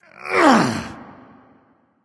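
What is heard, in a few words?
nonverbal grunt lift; smite; voicepack; ullr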